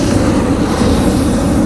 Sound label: Flame tower attack